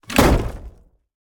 coffin close; Smite; Baron Samedi

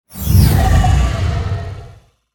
appear; smite; heimdallr

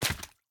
minion footstep dirt; smite; npc; roman archer

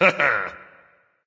"heh heh"; smite; voicepack; ullr

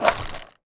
skateboard roll air; smite; mercury

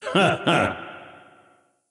Heh Heh; smite; kukulkan

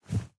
footstep; smite; cabrakan

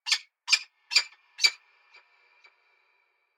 ambience bad birds; env s8conquest; smite

